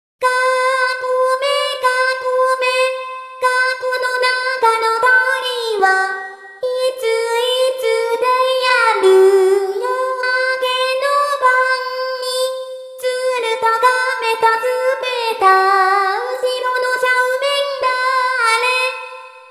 kagome kagome voice

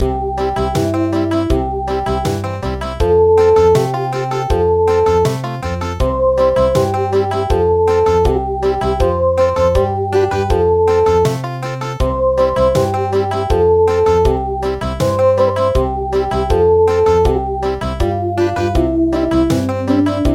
Country

